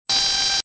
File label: intercom buzz; Soldier Of Fortune II Double Helix; XBOX